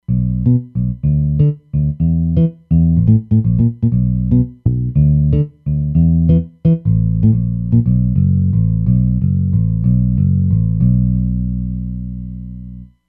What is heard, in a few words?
Compress Bass; Roland Fantom XR